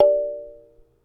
Kalimba D4 Tip Med

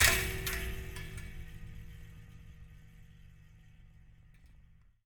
Colide

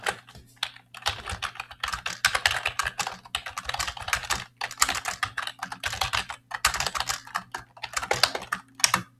Old Keyboard Typing

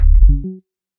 Ui Correct Button

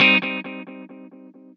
Cm Gt Chord

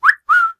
Whistle

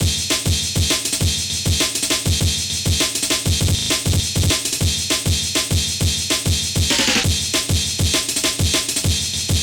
Intense Breakcore

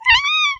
Meow

